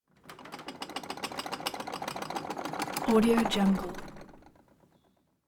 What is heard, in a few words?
weather earthquake earthquake interior table; game sounds